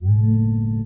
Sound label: powerup; Real War Air Land Sea